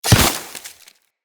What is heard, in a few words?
Great Sword Melee Impact Vegetation; Weapon Impacts; Player; Swords; Environment; Founders Great Sword